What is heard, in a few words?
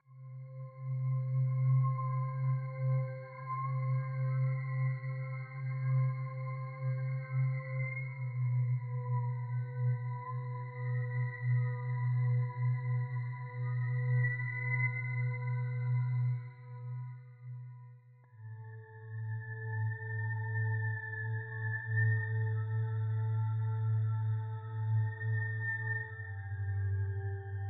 night in the forest; cormi